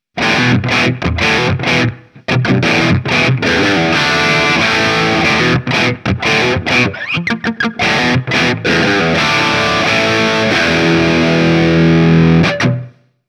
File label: Crunchy Rhythm